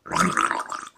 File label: burble, creature